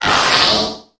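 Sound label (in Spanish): "Grito de Grimmsnarl", Pokémon; Mayor volumen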